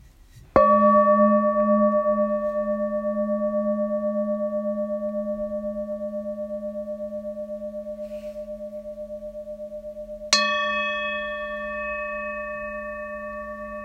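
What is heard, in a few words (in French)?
sol diese ancien, bols chantants tibétains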